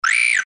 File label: robo